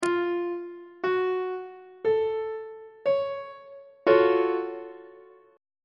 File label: f sharp minor major 7th 3rd inversion on treble clef